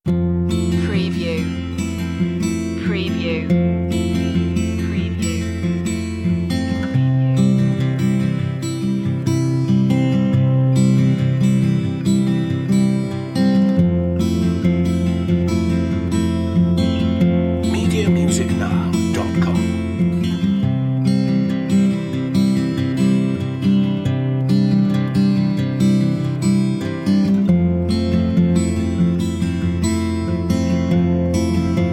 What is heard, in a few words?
summer rain underscore